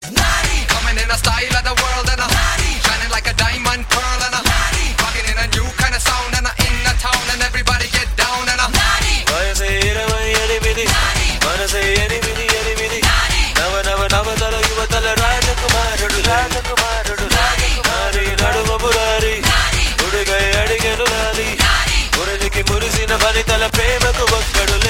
Telugu

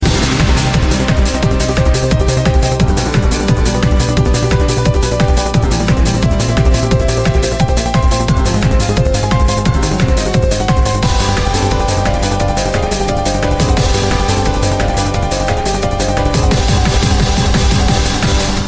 Classical

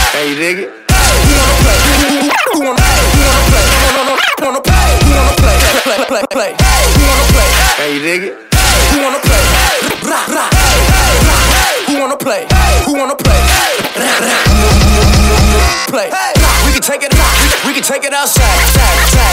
Two Turntables

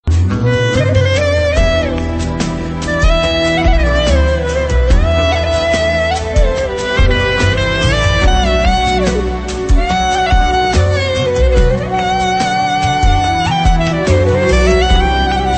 Sad Turk Music

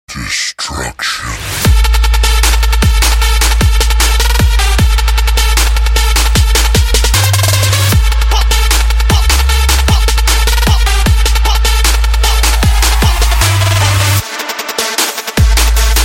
Trap Destruction

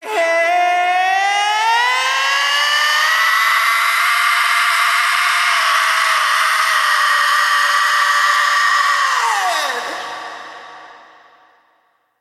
Scream

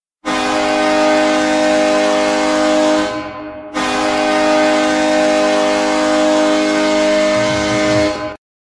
Goal Horn; Penguins

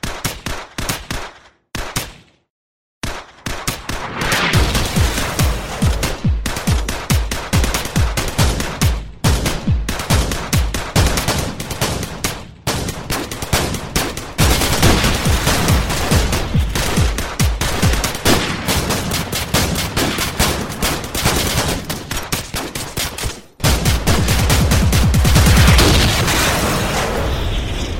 Gun Remix Tone

